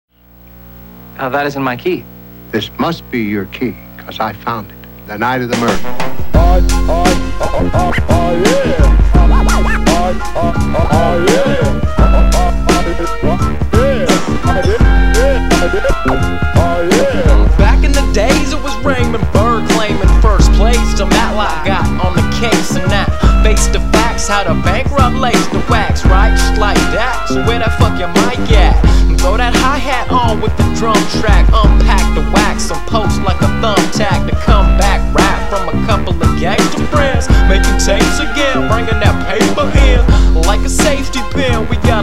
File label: Beat; Matlock